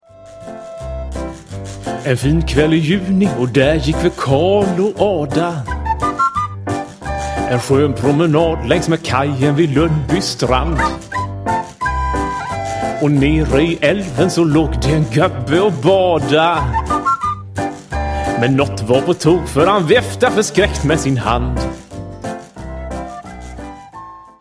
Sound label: intermezzo